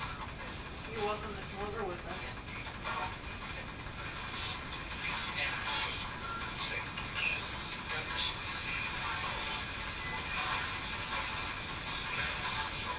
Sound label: Ghost Box